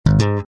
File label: bass Slap